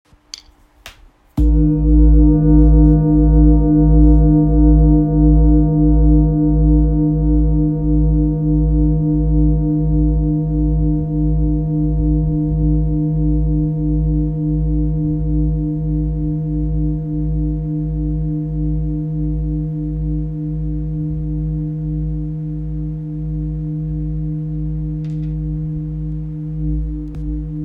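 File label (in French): Bol therapeute re diese, bol chantant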